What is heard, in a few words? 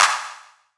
Clap 505; One Shots; Drums; ASR; Ableton Live 12 Lite; Core Library